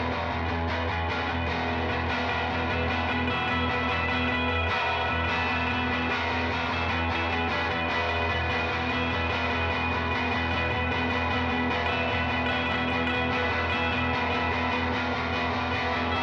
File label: GUITARS PROCESSED